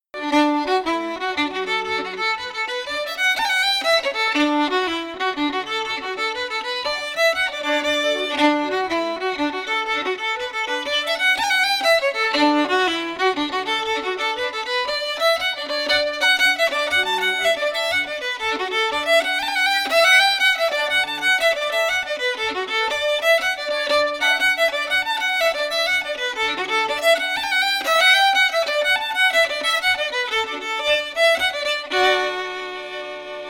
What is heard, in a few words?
fiddle; jacksons morning brush; SeamusGrantFestival